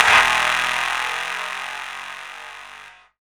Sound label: CLAP FX